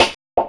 Wah Snare; Sonic Foundry (Sony Creative Software); Esoterik Beatz; Wah Kit

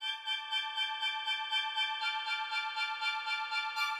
Strings (120BPM); musicradar

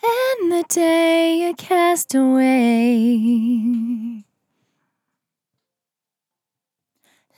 On A Star 65bpm F, Vocal; Zero-G

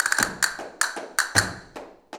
CASTANETS, Flamenco

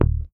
HOFNER MUTE, Bass

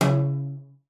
CELLO FINGER C#3; Xsample VOL-5

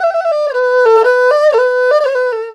ERHU LICKS; Best Service ProSamples vol.52 - World Instruments 2; AKAI

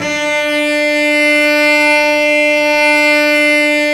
STR Vc Arco p

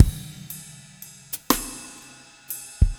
six8 drum 120 ride, Rock Heaven Drums; Quazibeat

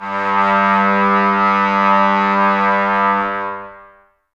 brass, Tbs Mute

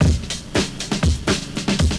nicebreak, breakcore breaks

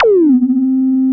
DRONE ZAP; Back In Time Records; Big Bang; THE TECH

